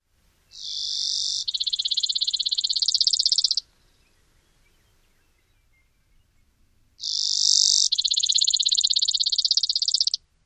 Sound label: songs